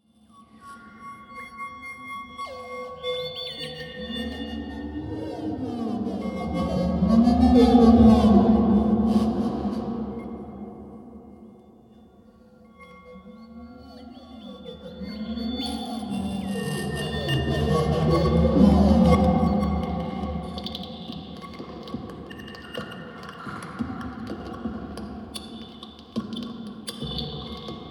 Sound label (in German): Tiefgarage